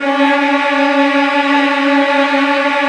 C#1, Strings, STR HLF TRLL; ILIO; Kirk Hunter Virtuoso Strings VOL-3; 90 s Sample CDs

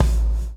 BLOW; Spectrasonics - Backbeat

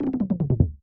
synth soft tom fill BPM150; fills

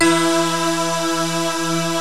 MULTI SYNTH

